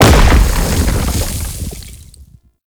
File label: Octopede Horrors Rock Fall B, Sounds Enemies; Warframe; Man In The Wall